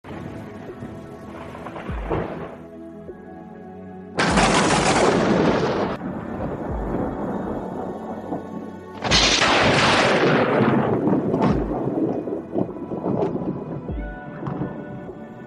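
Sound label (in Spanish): Espectacular Tormenta Eléctrica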